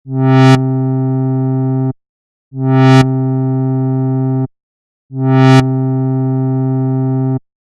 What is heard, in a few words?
ADSR